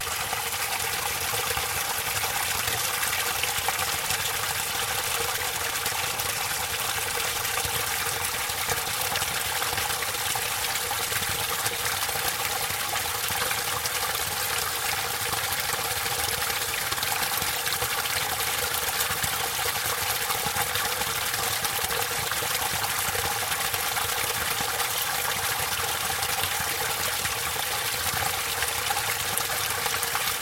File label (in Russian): nebolshoj ruchej na prirode